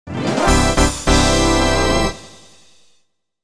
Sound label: Starting Race (Versus); Mario Kart 64; N64